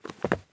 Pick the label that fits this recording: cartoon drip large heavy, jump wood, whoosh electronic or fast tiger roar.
jump wood